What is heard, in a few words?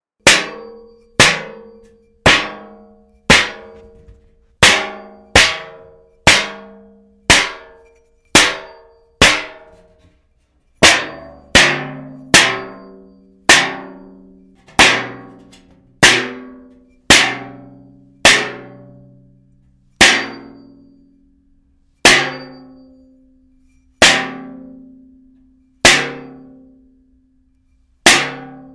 metal hit